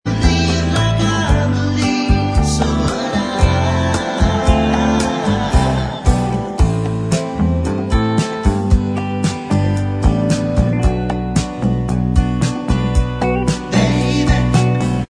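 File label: backing vocals, backing tracks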